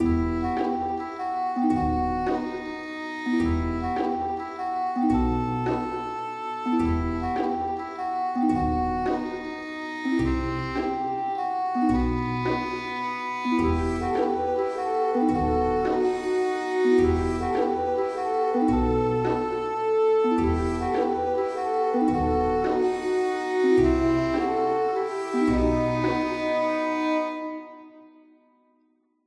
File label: Smug Ship Horn; tintin reporter cigars of the pharaoh